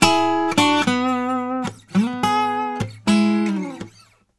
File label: Blues Guitar; ILIO; Fretworks; LICK